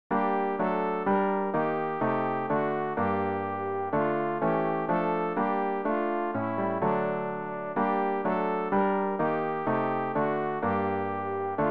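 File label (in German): sopran, Übehilfen; Ref. Gesangbuch; RG 165: Liebster Heiland, wir sind hier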